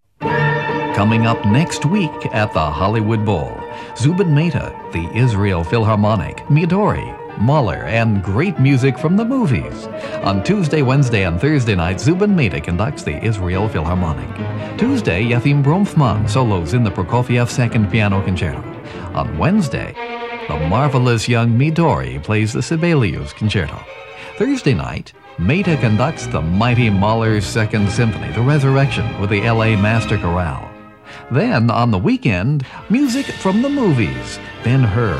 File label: bowl